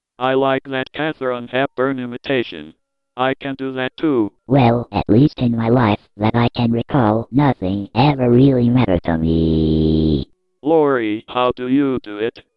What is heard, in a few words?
IMITATION VOCALS